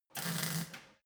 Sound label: weld sizzle short soft